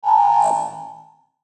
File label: button digital dark; Sound Design; Multimedia